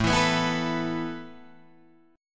A Suspended 2nd A Guitar Drop D

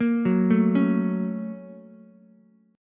Bb Minor Major 7th Sharp 5th Bb down Ukulele

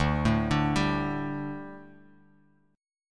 D Suspended 4th D, down

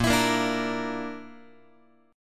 A sharp Major Flat 5th down Guitar; strummed

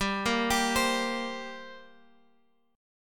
G Minor Double Flat 5th, G, Guitar, Open F; Gmbb5; arpeggiated; 19,17,19,19,x,x

